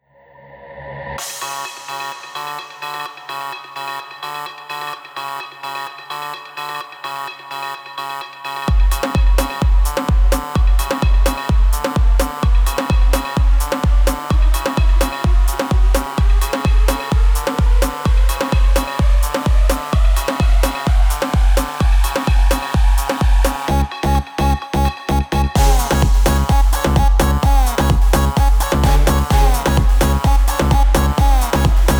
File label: house electronic techno; By Burak Sound Bank